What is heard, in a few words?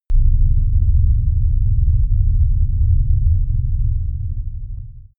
rumble